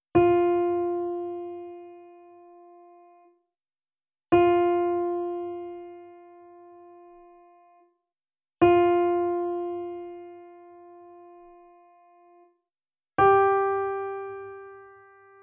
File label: f4 g4 a4 yamaha p515